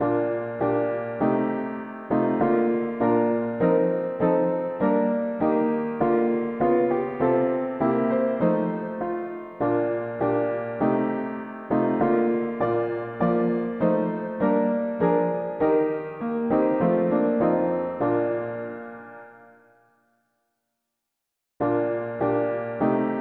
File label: Father we commend our spirits mono piano; hymns